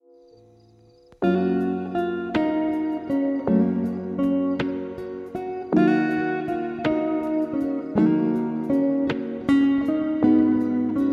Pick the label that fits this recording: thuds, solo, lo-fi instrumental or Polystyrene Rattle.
lo-fi instrumental